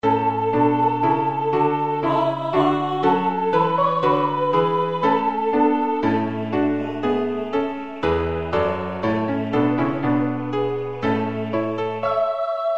Gloria Sopr.; C.Saint-Saëns; Oratorio de Noël

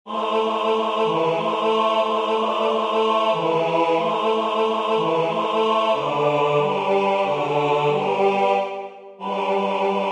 Slavikovsky Polecko Maly Bas; A.Dvorak

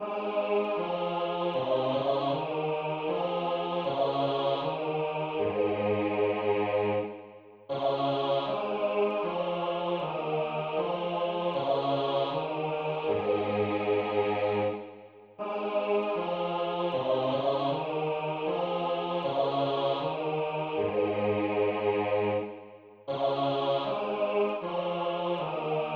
Bas; C.H.Graun; Der Tod Jesu

